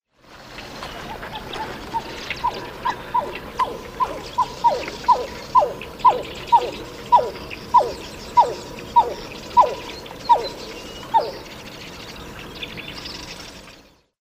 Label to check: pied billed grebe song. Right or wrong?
Right